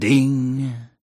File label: ding